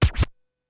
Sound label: SHORT SCRA, Vinyl Scratches